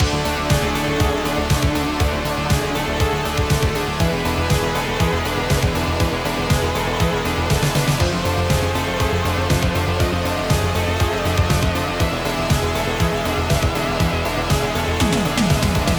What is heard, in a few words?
Song Loop; Endocrisis